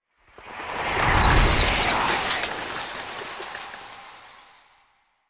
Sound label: intro bats animation; dracula